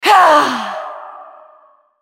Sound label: spell cast effort; Lissandra